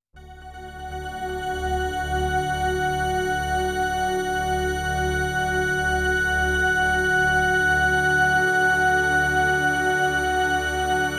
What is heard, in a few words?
Ambient